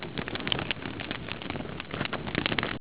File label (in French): feu